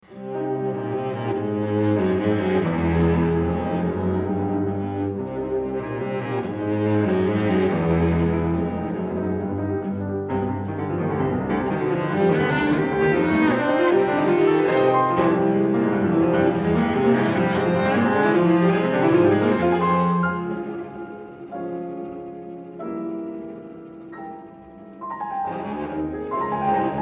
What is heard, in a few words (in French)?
sonate pour violoncelle et piano; SAINT-SAENS; n1 op 32